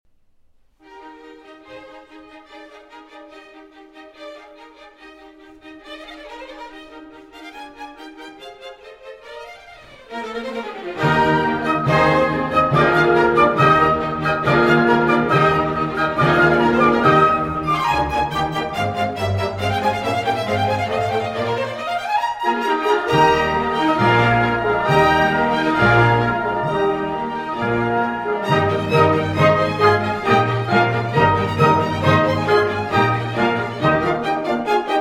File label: Trumpet Concerto in E-Flat Major Finale. Allegro; Hob. VIIe (1796) III.